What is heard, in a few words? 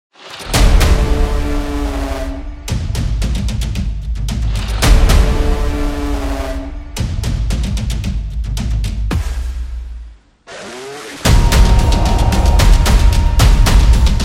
Drums Cinematic; tribe